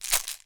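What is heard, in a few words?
COW RATTLE, PERC; AKAI S6000 CD-ROM - WorldSounds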